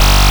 Anasound Short mid G, Industrial Analog Single Notes; Sonic Foundry (Sony Creative Software)